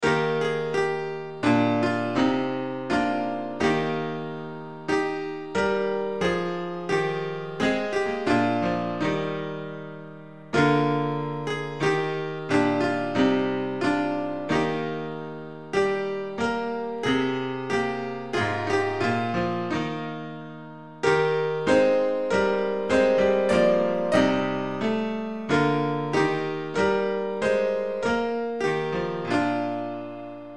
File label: instrumental hymns; the voice of god is calling